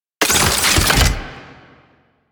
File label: Scan Pillar Plant Close; DE; Warframe; Frumentarius; Scan Ability